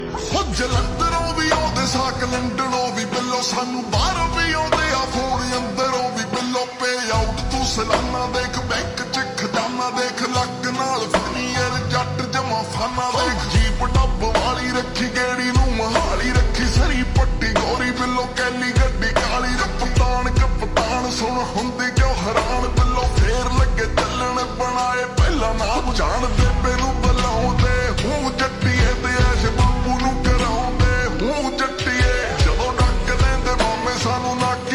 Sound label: Slowed Reverb